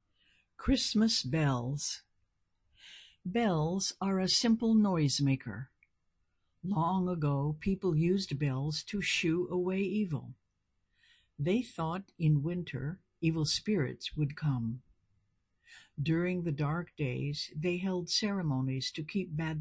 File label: Christmas Bells; english contest